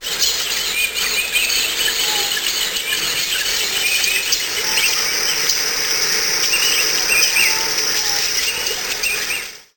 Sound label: forest rain